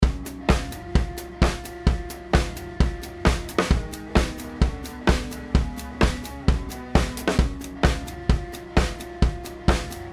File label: Drums